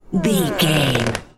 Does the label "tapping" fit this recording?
No